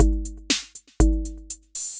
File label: Kit 606, Effected Drum Kits; Drum Machines; Ableton; Factory Packs